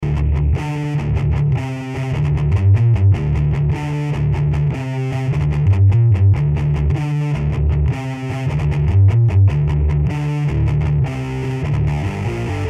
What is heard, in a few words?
Zoom DZ Drive